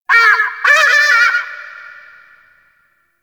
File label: Forest Creature Mid Far, Ambience; Warframe; Grineer